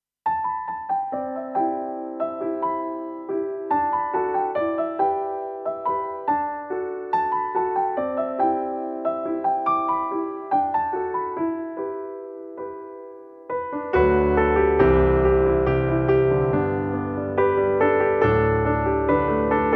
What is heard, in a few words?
Piano Version